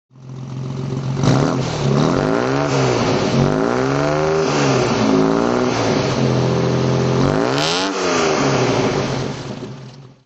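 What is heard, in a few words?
jaguar mk4 throttle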